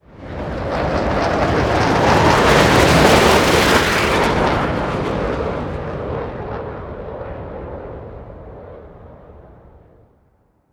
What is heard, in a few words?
Jet Ship Pass By; Blastwave FX